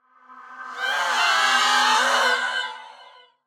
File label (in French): Banshee Cri; Phasmophobia